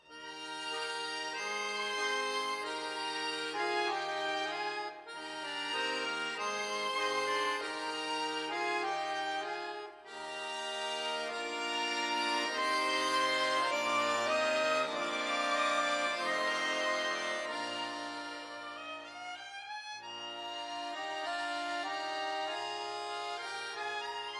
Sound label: Instrumental